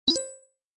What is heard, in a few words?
alert